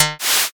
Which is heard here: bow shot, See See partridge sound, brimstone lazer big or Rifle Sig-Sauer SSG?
bow shot